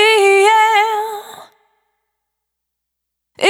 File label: Urban, Single Voice Melodic Loops, Vocal Samples